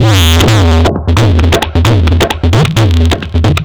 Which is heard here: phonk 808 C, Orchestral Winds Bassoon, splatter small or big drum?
big drum